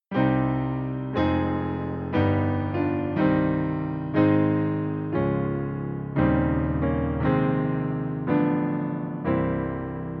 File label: Prelude in C